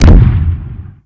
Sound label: splode far